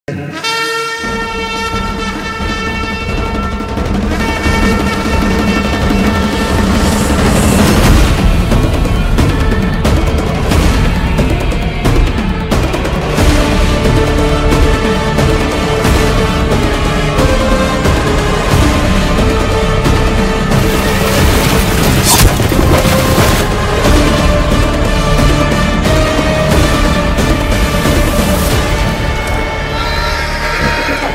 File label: bgm; Chiyaan Vikram